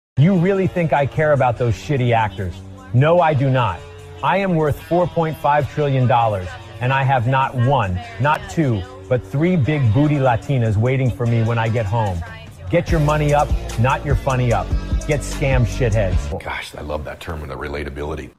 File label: darrrrrrr